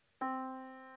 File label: Yamaha Disk, piano sound